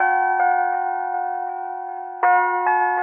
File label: keys